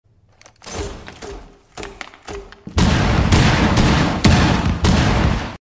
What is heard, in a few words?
cluster charge; fuze